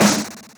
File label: Snares; Vengeance - Essential Clubsounds Vol.4